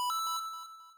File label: coin